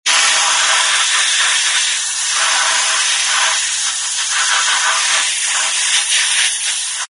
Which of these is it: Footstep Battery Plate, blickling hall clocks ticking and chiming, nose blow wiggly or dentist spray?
dentist spray